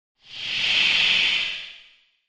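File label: Air Hiss Sliding Door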